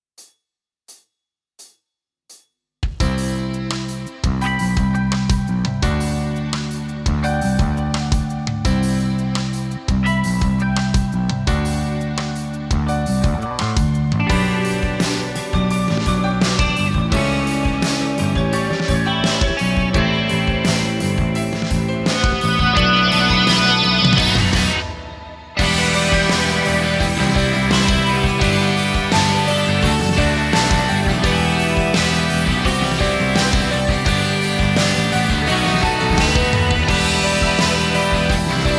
vo off

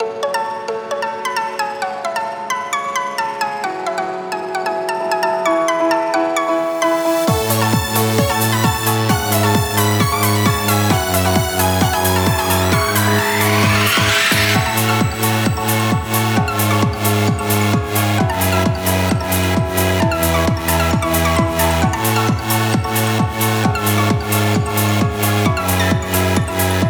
karaoke

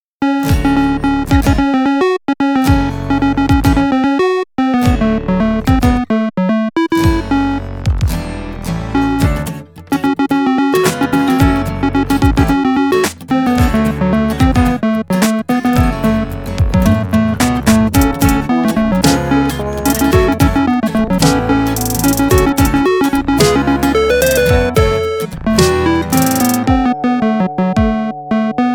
Guide Synth Mix

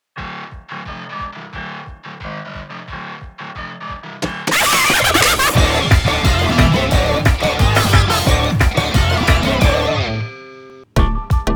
Straight BPM178 Inst Mix

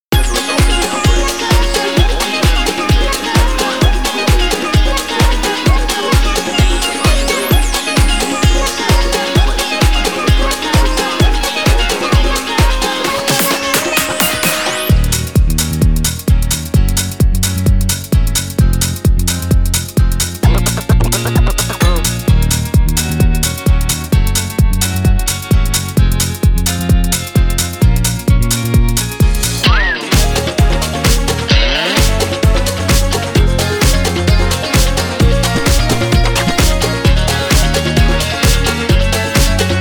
Summer Party inst

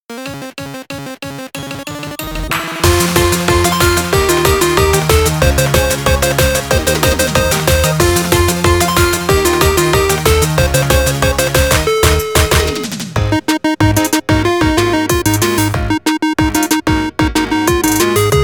Synth Mix

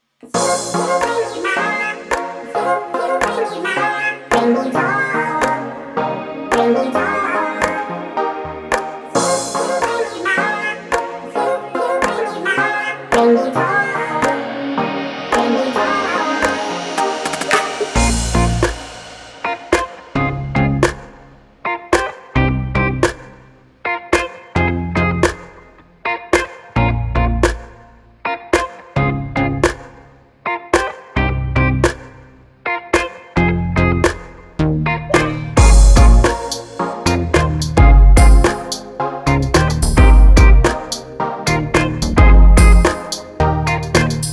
inst bpm109

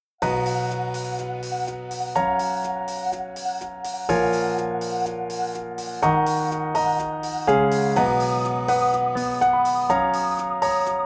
bpm124

